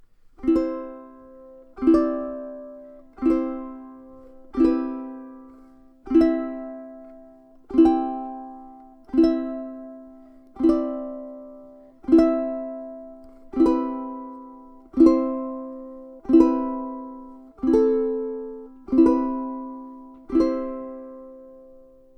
open strum, ukulele

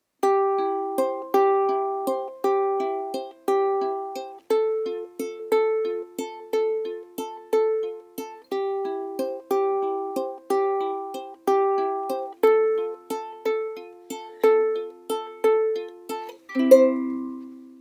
fingerpick, ukulele

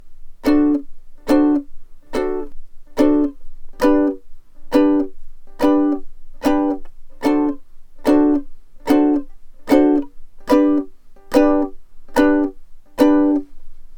downs, ukulele

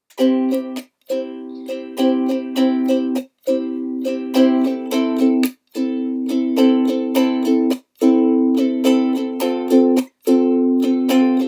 standby

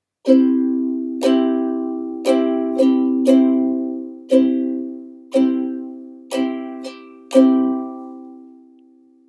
strum, ukulele

